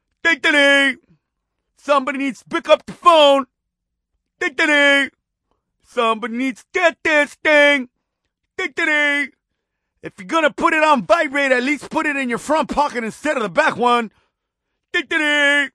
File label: cute funny ringtone